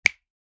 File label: finger snap; immersive-home